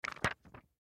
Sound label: Magazine Turn Page; Foley; Materials